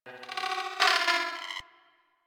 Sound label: Beep Stutter; Warframe; Ambience; Corpus